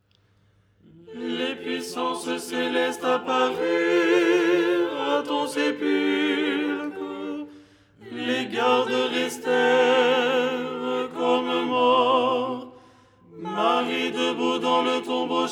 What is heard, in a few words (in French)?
tenor; Tropaire du dimanche; Les 8 tons en français; ton 6